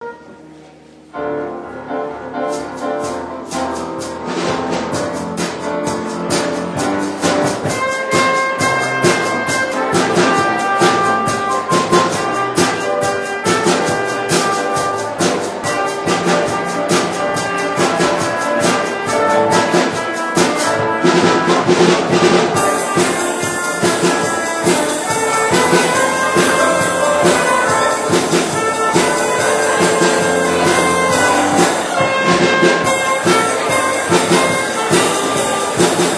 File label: Trumpet Piano Drums